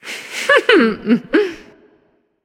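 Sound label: heh heh, female; smite; voicepack; hera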